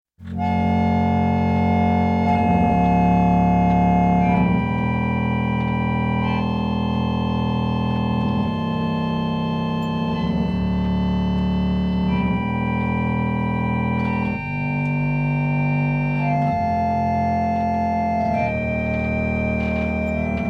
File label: chromelodeon